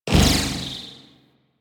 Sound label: Plasmor Ricochet, Shotgun; Warframe; Weapons; Corpus; Arca Plasmor